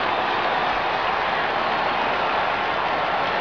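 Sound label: ambience crowd huge loop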